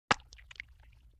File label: Spit Tobacco; Vintage Cartoon; Comedy Vocals